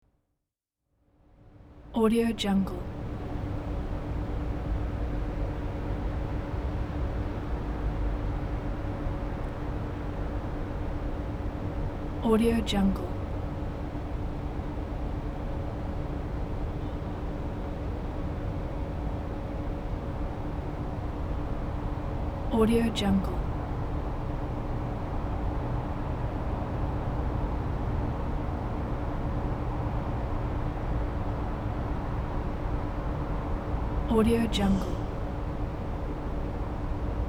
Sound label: night city street